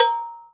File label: Cowbell